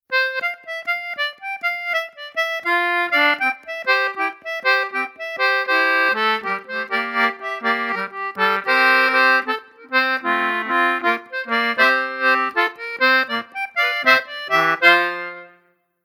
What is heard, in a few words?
swaggering, morris; contemporary